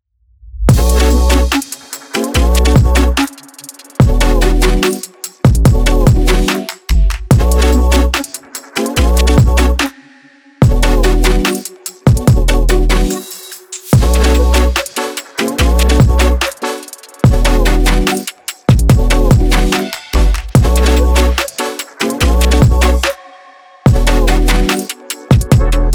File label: stylish